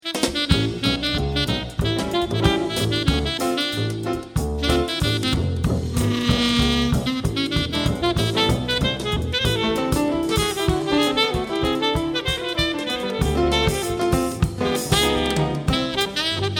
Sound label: Jazz Instrumental; Ensamble: Guitarra & Violín